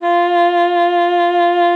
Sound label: F4, alto sax; Xsample VOL-2